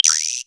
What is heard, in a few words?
127 DRM, Fresh Disco House